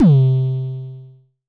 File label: Dist Kicks C3, Vermona Kick Lancet, Distortion Kicks; Drums; LookIMadeAThing Kicks